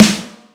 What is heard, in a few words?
soft hit clap